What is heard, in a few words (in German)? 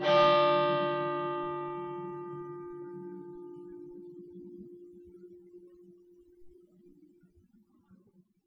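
Schlag, Glocken; Maria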